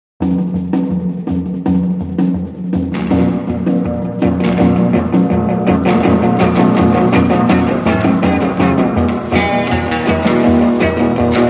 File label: Jingle Bells; BLACK CHRISTMAS